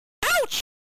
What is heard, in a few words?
ooouuucchh